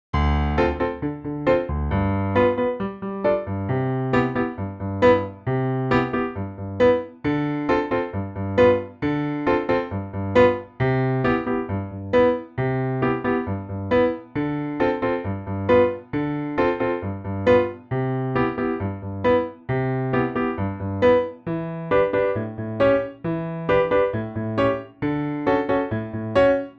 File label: Piano